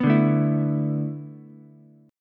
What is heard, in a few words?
B Minor 11th B down Ukulele C6; Bm11 strummed; JGuitar